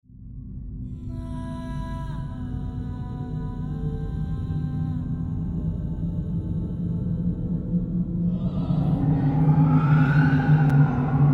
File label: Halloween Ambience